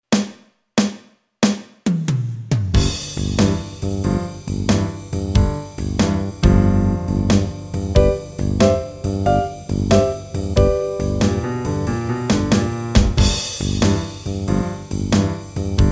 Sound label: Blues Slow